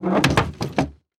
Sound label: Household Nightstand Knock Over